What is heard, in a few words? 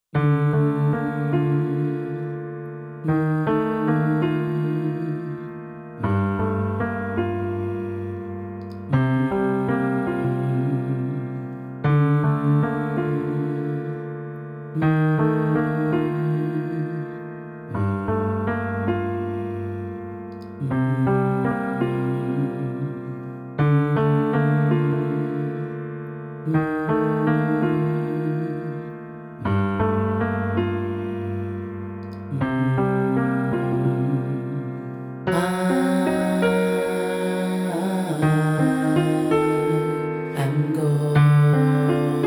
BASS GUIDE